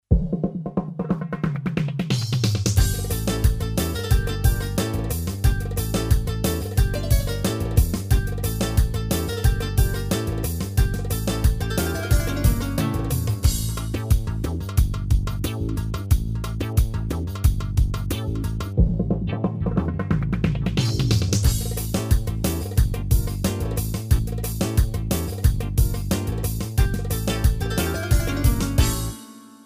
ctelli, yamaha psr a300tr v2 ritm

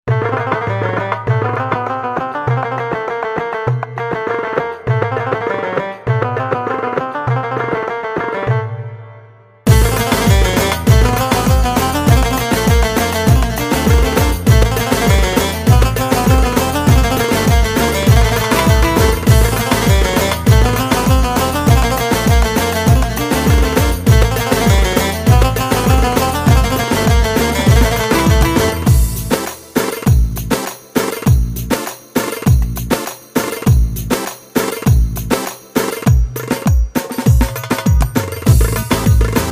kesikcayir, psr or700tr v2 ritm

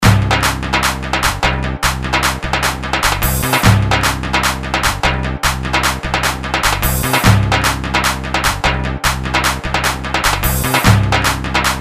gw 9 8, ritim

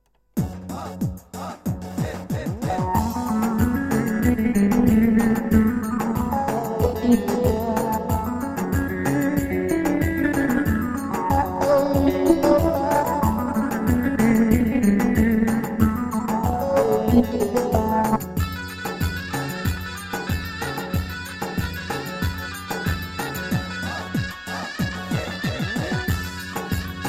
delilo zurnalar, korg pa 55 halay zurnalar

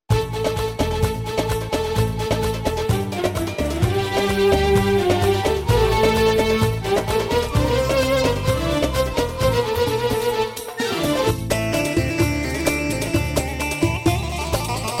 oryn, pa800

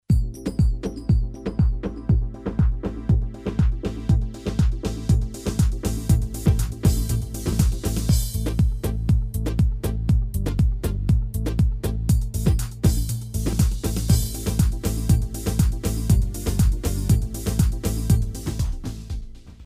trdance, yamaha psr a300tr v2 ritm

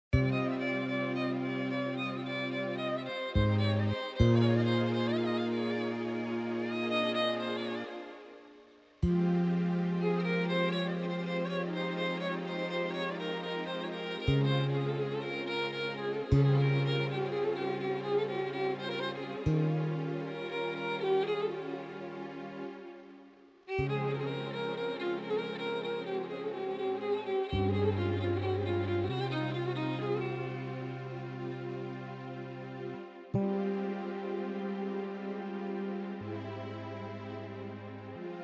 keman taksim, yamaha tyros2

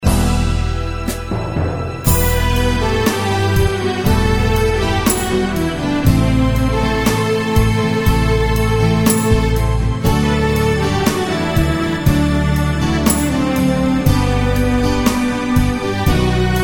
6 8 slow deneme, bk 5 ritim

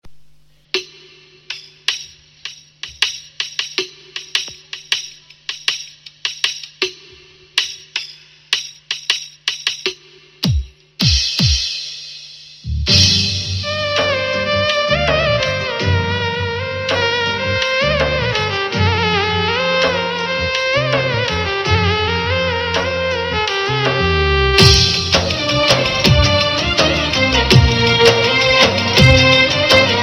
pa1x keman yay

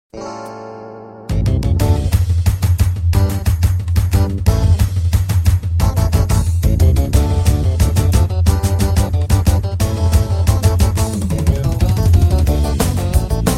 pa3x bati, turkis sesler

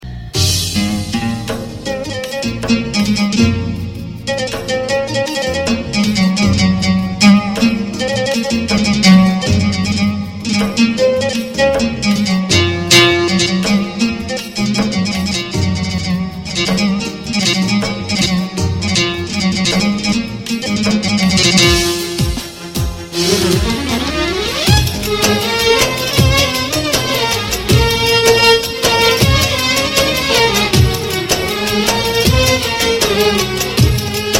pa1x ud kamanjat

